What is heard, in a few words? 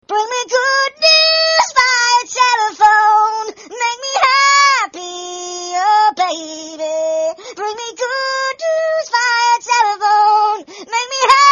funny musical tone ringtone